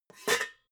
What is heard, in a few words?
pots clank soft